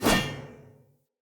Player Sphere Polearm Whoosh; Weapons

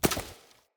Acorn Impact; Cinematics; Bromius; Bromius Tree Rub; Bromius Quest

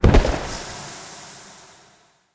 Staff Caster Proj Destroy, Weapons; Player; Staves; Galene

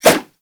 Nunchaku Whoosh; Warframe; Tenno

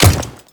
Default Step; Enemies; Footsteps; Warframe; Grineer Royal Guard

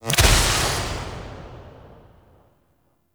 Grimoire Impact; Warframe; Tenno

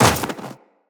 Run Snow, Footsteps; Tenno

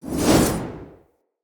Long Sword Whoosh Heavy; Weapons; Mother

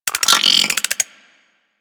Leech Projectile Bite; Leech Osprey

